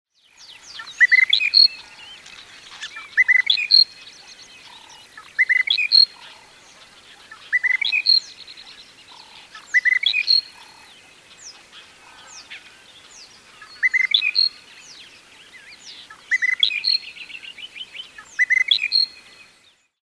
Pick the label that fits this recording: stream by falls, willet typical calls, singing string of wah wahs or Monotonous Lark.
Monotonous Lark